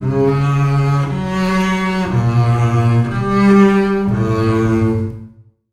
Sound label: strings, Sad Loops